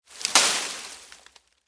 Foliage Drop Move, Palm, Frond; Texture; Rustling; BlastWave

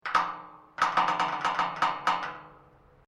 GATE METAL LARGE CREAK, Large metal gate; Doors

